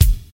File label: clicky bass drum sound E key, Royality free kickdrum sound tuned to the E note; kick drum samples